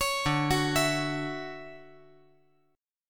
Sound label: D-Major 9th D down